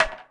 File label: wand